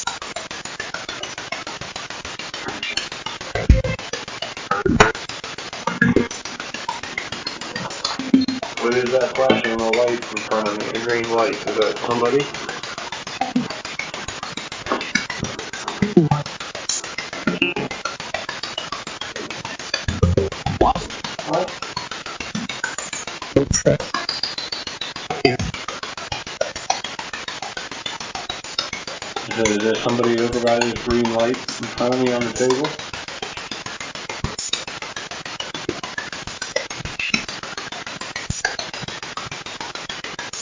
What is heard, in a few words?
2nd Floor Middle Room; wexford general store antique shop return investigation